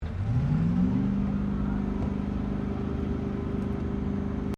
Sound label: ENGINE CHEVY C5R 7LTR IDLE HIGH DISTANT, Army recruiting vehicle; Trucks; Vehicles